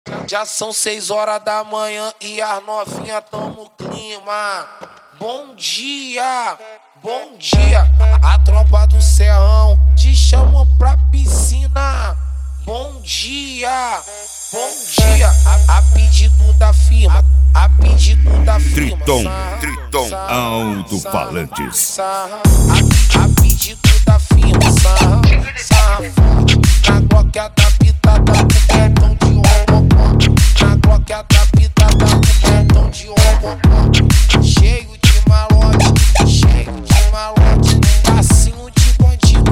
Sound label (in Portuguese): alto falantes especial funk mix; Triton alto Falantes